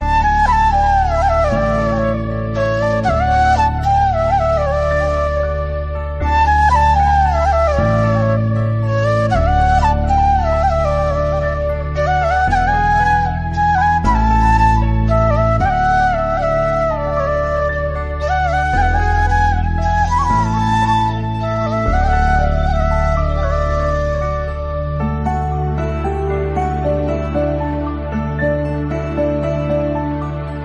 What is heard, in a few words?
Instrumental Ringtone